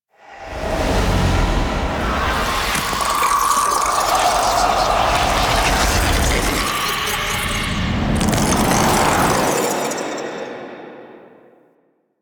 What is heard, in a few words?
Sentient Reanimate; Warframe; Quests; The New War; Narmer Outpost; Chamber Ceremony End